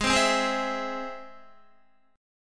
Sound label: Ab Augmented Ab Guitar; 15,15,12,13,12,12; Ab+ strummed